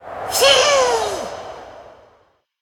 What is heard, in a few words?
Snowman Attack